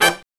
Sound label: HIGH HIT, Brass Riffs